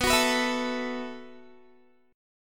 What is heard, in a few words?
B 7th Suspended 4th B, Guitar; B7sus4; strummed